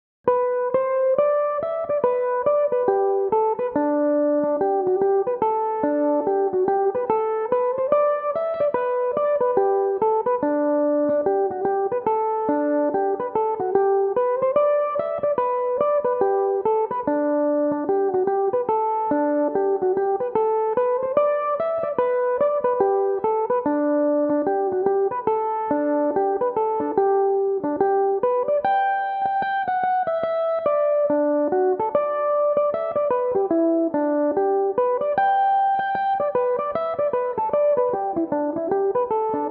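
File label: schottische, G major, mandolin; The Old Times Schottische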